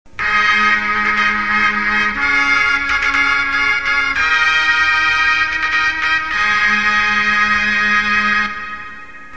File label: trumpet